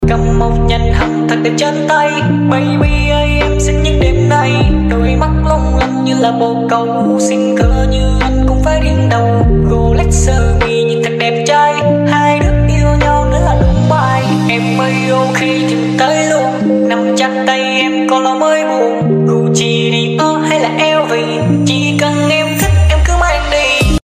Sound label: Lofi